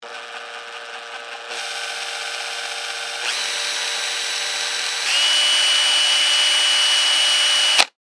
Cordless Drill, Industry, Cordless, Drill, Dry, Burst, Slow, To, Fast; Drills; Blast Wave